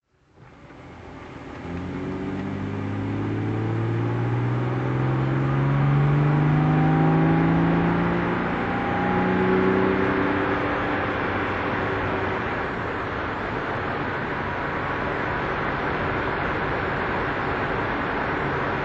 daimler double six lwb drive; Daimler Double Six 'Vanden Plas' LWB, 1973; Engine sound driving; V3D/ ClassicarImages, Marc Vorgers

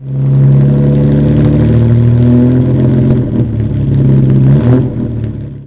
Jaguar MK I 3.4; 1959; Engine sound; V3D/ ClassicarImages

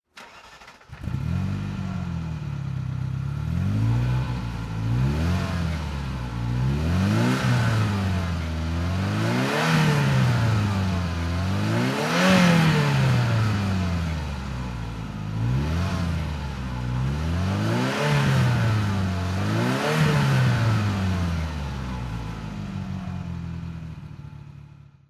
ferrari 328 gtb throttle

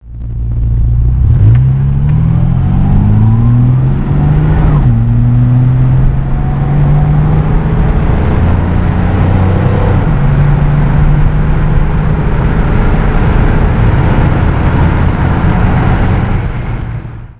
etype v12 drive

